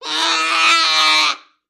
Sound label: Bear, Unknown Type Cries. Single Cub Cries. Close Perspective.; bears